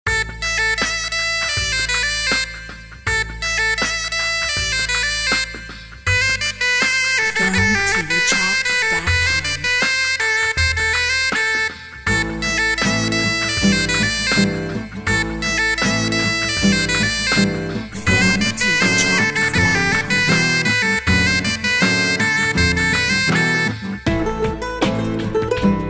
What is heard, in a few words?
Celtic Ballad